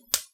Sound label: spark, recorded from a small Tesla generator; Electricity